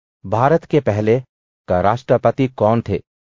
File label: techno